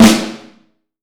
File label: Vintage Snare Drum; Q Up Arts; Johnny.C's Vintage Snare Drum; 6.5X14